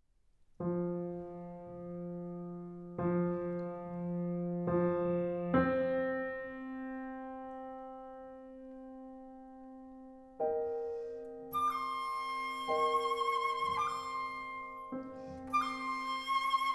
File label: Flute De Pan; Mouquet; Pan Les Oiseaux